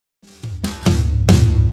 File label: fill